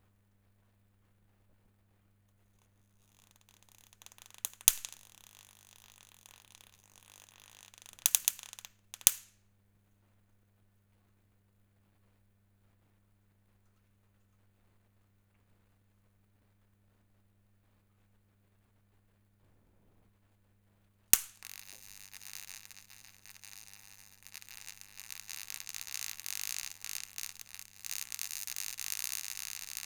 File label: burn insect burn; tascam